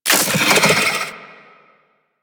Wall Eye Detatch

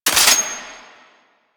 Sniper Low Clip Mech; DE; Warframe; Frumentarius; Exalted Sniper

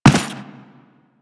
Run Default, Footsteps; Warframe; Teshin

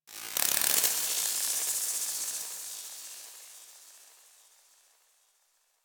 Ship Metal Movement Texture Small, Ambience; Warframe; Corpus Ship Remaster

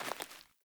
Sandals Walk Vegetation, Footsteps; Characters; Player

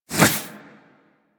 Warfan Whoosh; InfWarfan; Warframe; Weapons; Melee

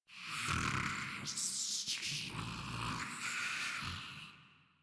Breathing; en; Warframe; Grineer; Ghoul

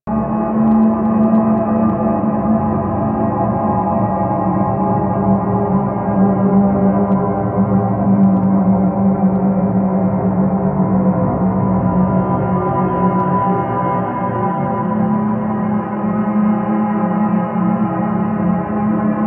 Dead End Drone Amb B; Ambience; Interior; Warframe; Orokin

